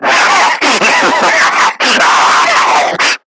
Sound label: Screamer Self Destruct; Warframe; Lasrian